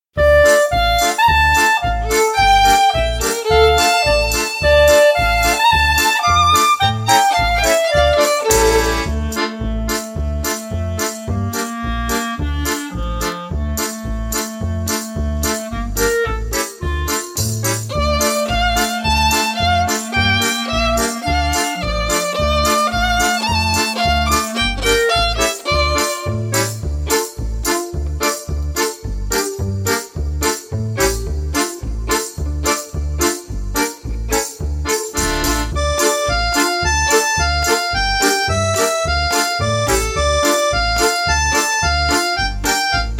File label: Hanukkah Is Here Kinder Instr; holiday songfest